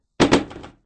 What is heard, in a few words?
box drop